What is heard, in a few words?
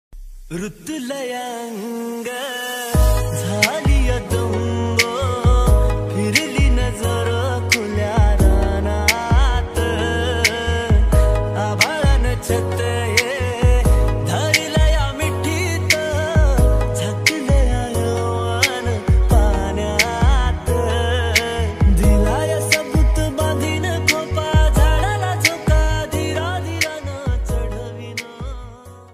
new viral marathi ringtone